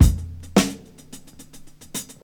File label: 108 bpm breakbeat g sharp key; drum loops